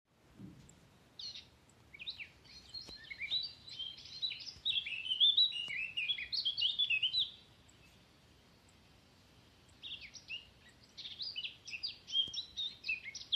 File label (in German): Moenchsgrasmuecke